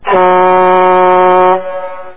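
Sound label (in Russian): гудок парохода с отголоском эха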